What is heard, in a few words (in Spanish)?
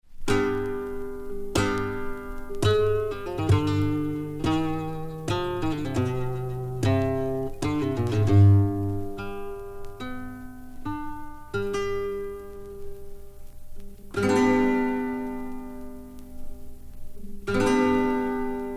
Reloj flamenco; de Cordoba